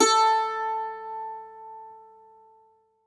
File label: MANDOLIN, Plucked Strings